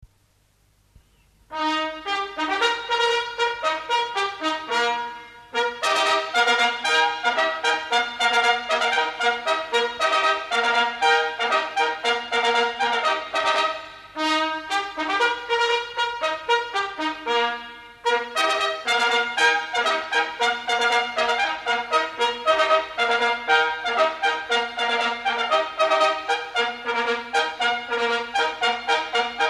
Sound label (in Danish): Trompet march